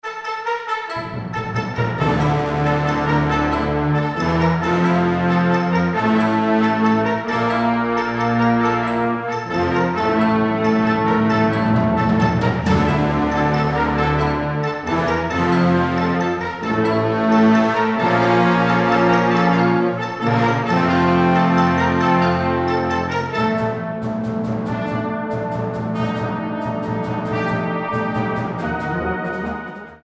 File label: Brass Band